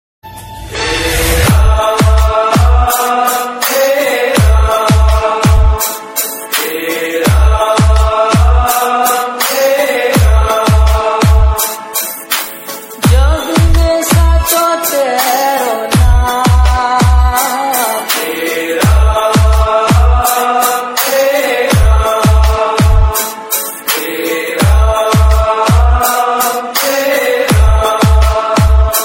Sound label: Hey Ram Song Dj Remix Ringtone